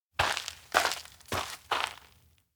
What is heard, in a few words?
steps outdoor mountainroad walk; light woman; anemoi